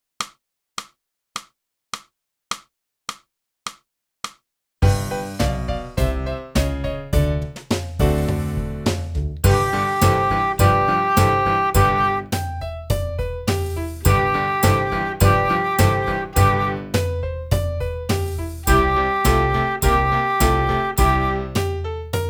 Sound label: flute; boosey